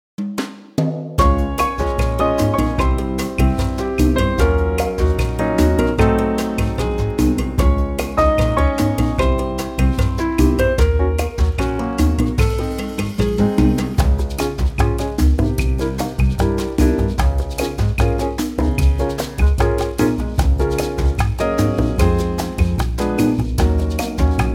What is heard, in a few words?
Db Latin; key - Db - vocal range - Ab to Db; Trio plus latin percussion arrangement